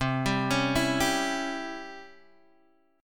C sharp Minor Major 7th Flat 5th C, guitar chord

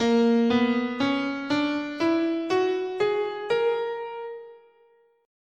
A sharp Altered, scale

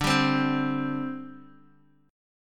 D 7th Suspended 4th, down, Guitar, DADGAD

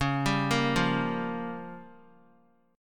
C sharp 6th Flat 5th C sharp down; arpeggiated; C#6b5; JGuitar

